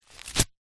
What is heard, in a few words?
paper thin folded thick rip; foley